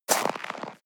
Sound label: step snow walk single hard; natur-tiere; schnee